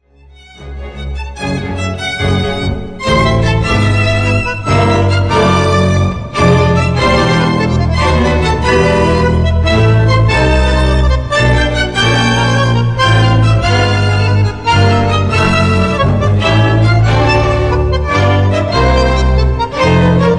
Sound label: Tango